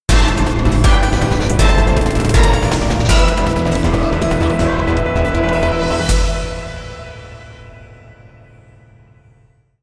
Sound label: Boss Defeat Jingle; rive wreck hack die retry; ps4; Dropship